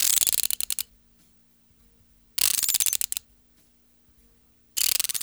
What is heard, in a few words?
RATCHT; East West; Funky Ass Loops